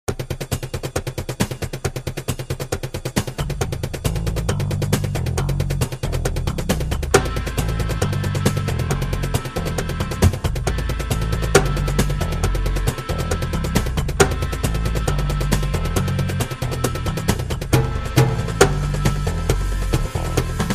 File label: intro afro latin percussion kit bongo conga timbales; african